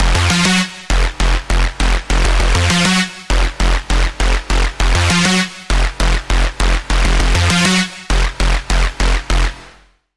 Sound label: Lead, Sylenth1; Routed Sound; Sylenth1 Expansion - Doom Carpenter Vol.1